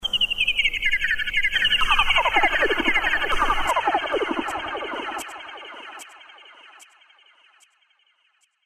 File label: Lazer